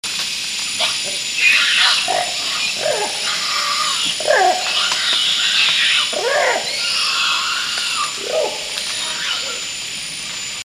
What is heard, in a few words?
Crying and Screaming